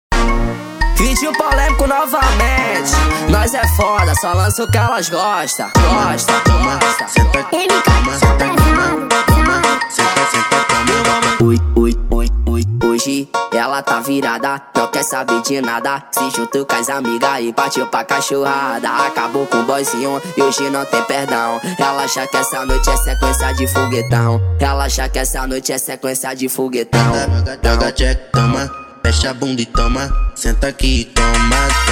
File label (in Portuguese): funk brega